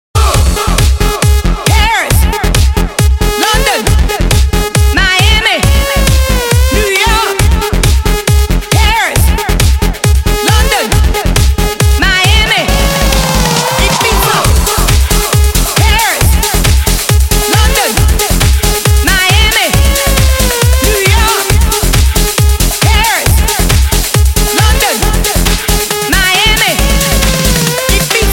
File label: Electro House